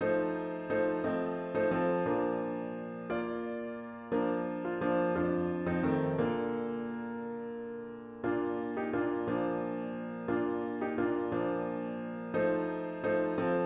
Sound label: Silent Night Holy Night piano with Intro; worship service